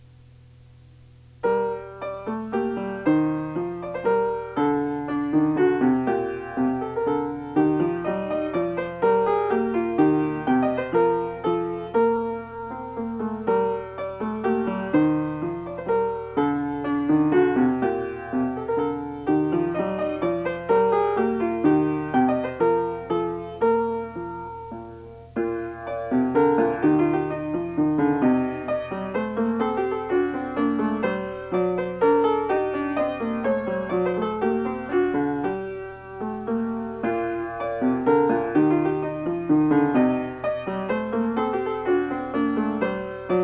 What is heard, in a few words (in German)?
Barock Klavier Suite, Menuett